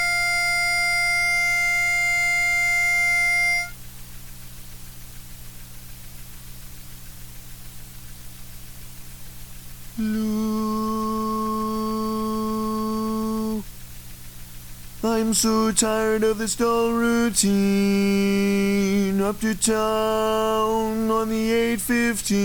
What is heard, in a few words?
baritone SOLO